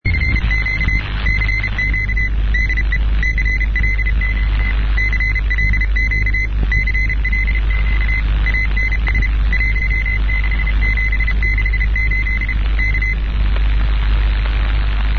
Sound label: cw